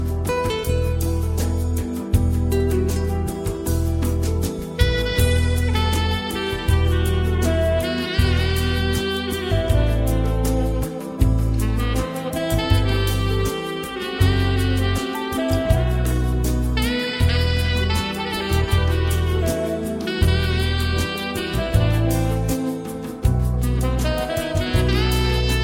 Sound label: Melody